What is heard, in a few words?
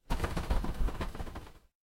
animal wing flap